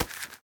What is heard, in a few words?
Match Box Pickup; The Town